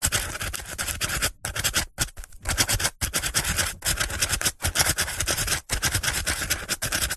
office marker pen writing on dense foam or polystyrene; zapsplat